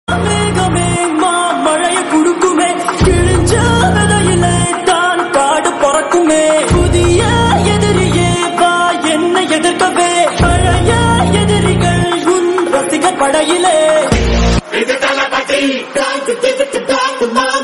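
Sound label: attitude background music ringtone attitude ringtone; varisu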